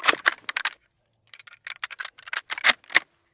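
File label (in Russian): оперативная перезарядка орудия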